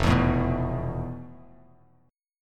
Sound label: F Major 7th Flat 5th Bass Standard; strummed; JGuitar